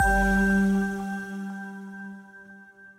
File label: prize bonus; Great Book Of Magic Deluxe; Wazdan